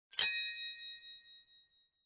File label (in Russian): kolokolchik